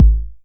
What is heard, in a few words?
909 BD; Goldbaby Tape808 & Tape909; The Tape 909